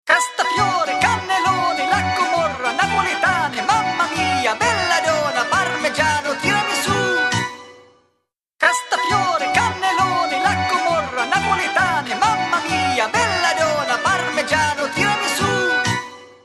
Tarantella, Ringtones

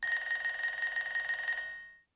ringer; Ringtones

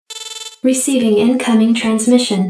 SMS Incoming Transmission SMS

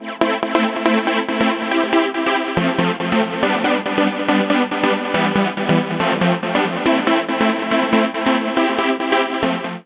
ringer; Ringtones

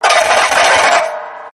Crazy Violonist; WWE tones; Ringtones

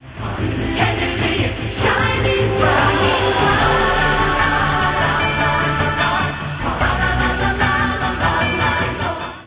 ringer; Ringtones

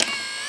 zoom in; Ringtones

